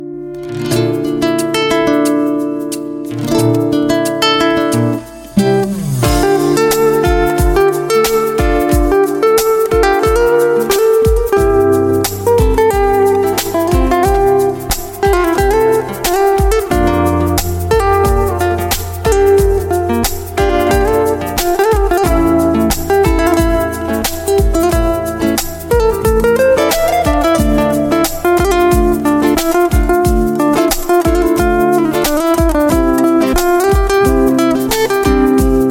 Guitar

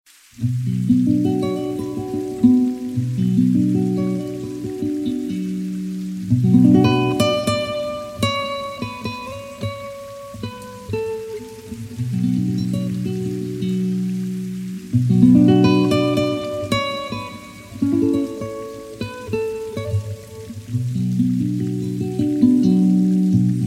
Guitar

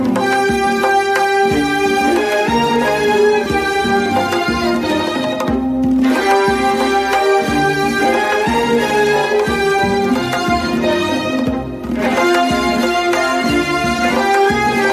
Piano

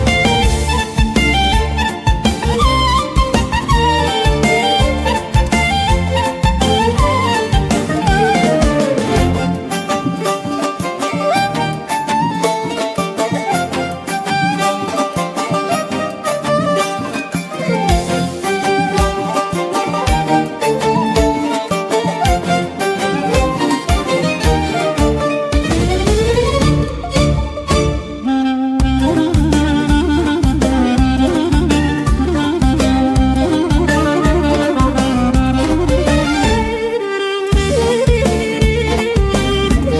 Melody

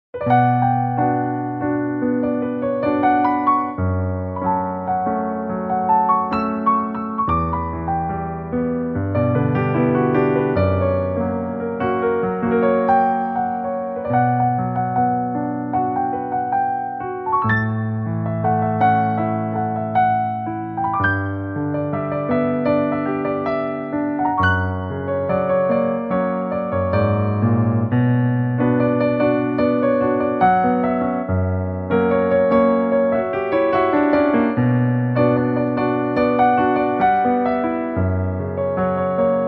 Piano

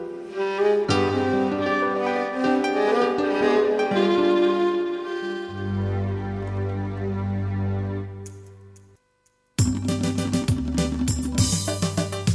Violon

